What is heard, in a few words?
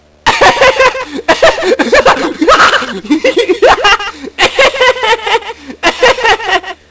ehhehe